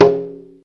conga slp, Yamaha TG-500

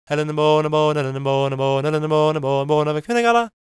GAELIC, World Voices; ILIO; Vocal Planet

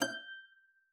HARP PIANO S, F#6; Xsample VOL-8

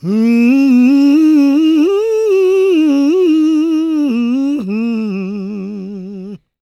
GOSP MALE, SOLO

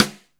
LIVE STIK, SNARES; Back In Time Records - Big Bang

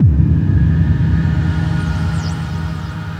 BD-FX, Dance Mega Drums; Best Service

